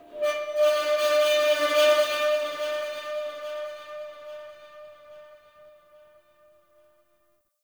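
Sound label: Corpus Ship Metal Scrap Texture Medium; Textures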